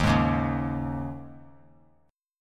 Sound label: Eb 7th Suspended 4th; Eb7sus4; strummed; JGuitar